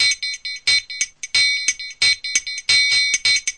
134 bpm triangle loop

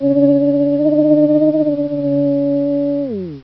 Scanner; Cheesy Lo-Fi Sound Effects

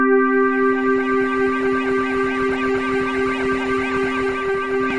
ADD Synth C3, Many Harmonics Synth

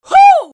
"hoo" (loud), Voice

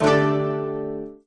shamisen chord; Far East Drones and Plucks

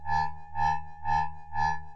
122 soft alarm beat; SYNTH AND ELECTRONIC LOOPS

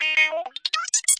guitar freakout gliss

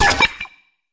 fx drum, Electro FX

